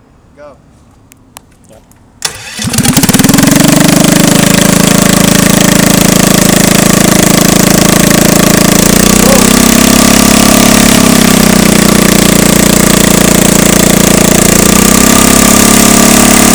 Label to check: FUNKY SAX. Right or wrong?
Wrong